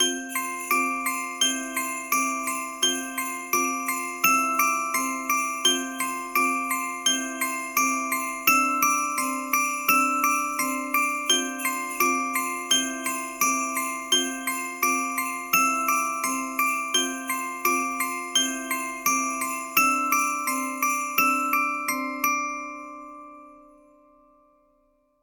Xmas Bellis

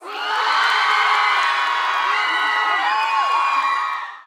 Asian scream

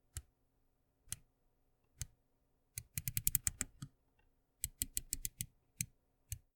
Radial Knob Clicks Turning Dial

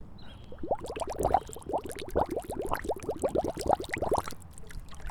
Bubbling Water

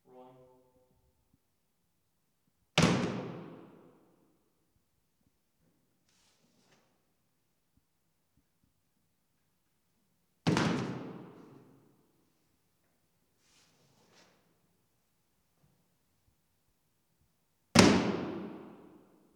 Three Thuds More Reverb

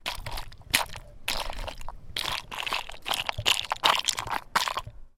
Wet Stone Walking

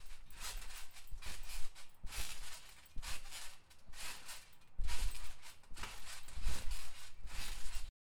Trampoline

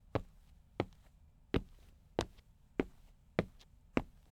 Steps

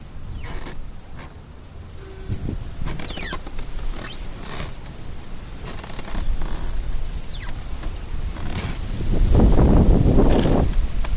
Treehouse during windstorm

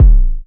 Big Kick

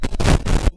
Glitchy

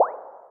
Bloop

